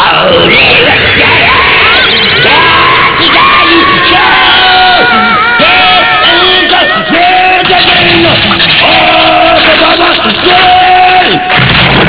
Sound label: giant song